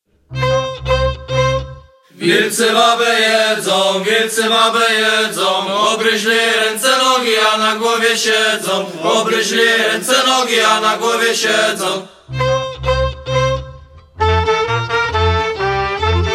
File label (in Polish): Polka